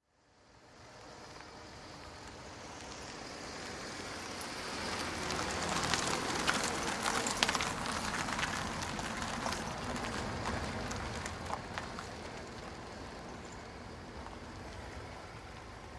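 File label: Car, By Slow, close up On Gravel And Pavement.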